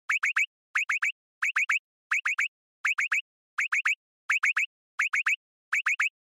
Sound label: Onoma Panic Slow